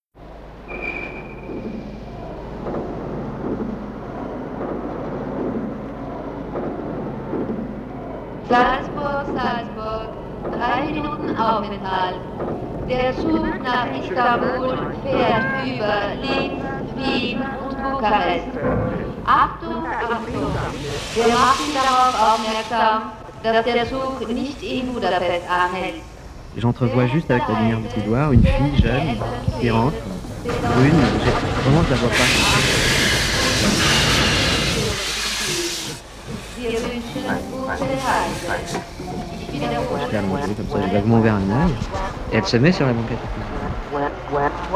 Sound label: Radio Nova Jingle; Nova 25 ans - 1989